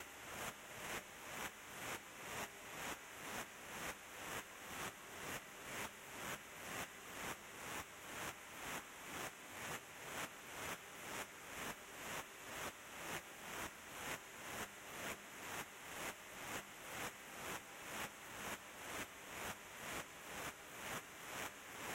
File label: NOISE; rain world